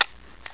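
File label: Voigtlander Vito CLR, camera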